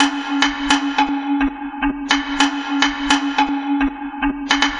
Mighty Klong, Acoustic Beats; Sonic Foundry (Sony Creative Software); Myths of Technology

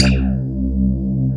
wet bass

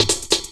LOOP SD, Drum & Bass, 140 BPM; Mono; C3; Ueberschall; Drum & Bass Resonance

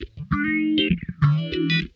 SOFT FAZE, Funk Guitar; 90 s Sample CDs; Zero G

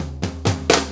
4-4 Fill, Drum Loops; Sonic Foundry (Sony Creative Software); Loops & Samples Drum Tools

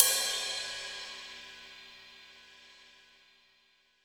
Rock Ride, cymbals; Roland BK-5

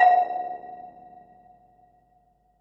Perc, Percussion and FX; Pearl SC-40

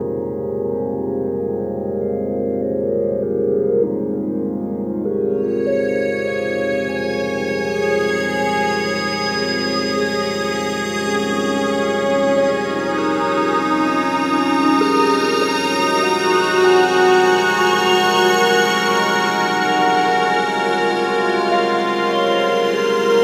Melodic Solo; Sonic Foundry (Sony Creative Software); Slow Silhouette

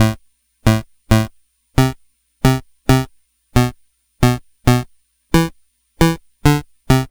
Game Boy Bass; 8-Bit; Chiptune; Nintendo

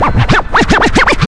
scratch; Zero-G; Freak Beats

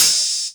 Open HH, Cymbals

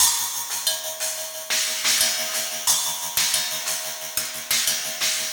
Cymbol Particle; Particles

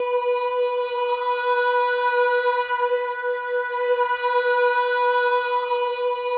MOVING V4, Symphony of Voices; Spectrasonics

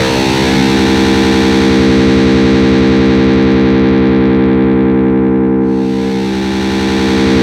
C2, Synth Line; 90 s Sample CDs; NiceBeats; SynthLine VOL-33

